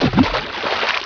bloop; CHASM

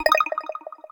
chain

